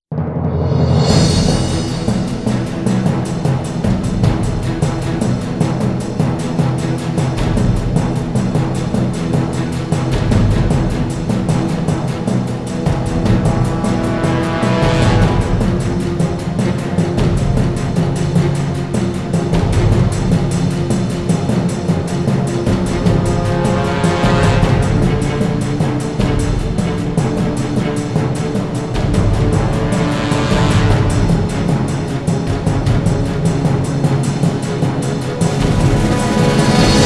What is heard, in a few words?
Instrumental; PM USA